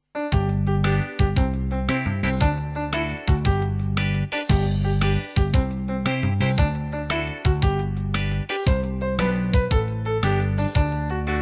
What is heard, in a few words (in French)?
Chant